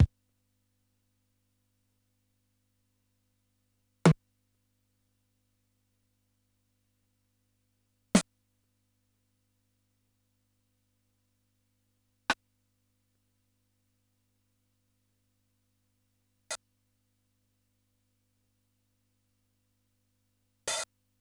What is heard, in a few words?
Keytek MDP-40